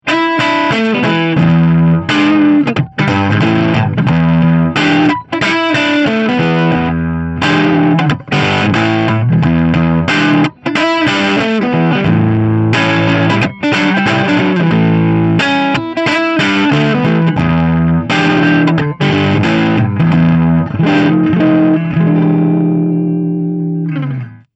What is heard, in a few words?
Pentode Half